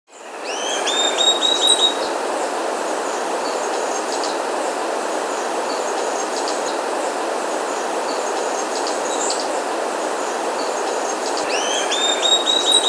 Barred Becard, Pachyramphus, Nature's Songs, S. America; Pachyramphus Versicol